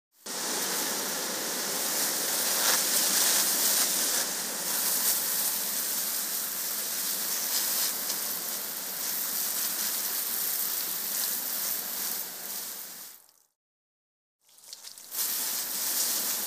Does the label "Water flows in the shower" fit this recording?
Yes